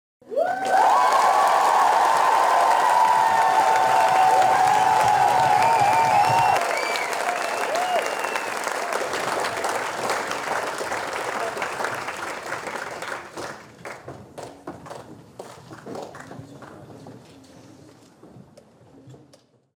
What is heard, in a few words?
Cheers